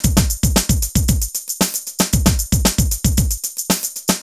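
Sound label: drum loops, right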